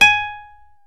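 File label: classical guitar, nylon string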